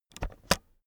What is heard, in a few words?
keyboard external space press slow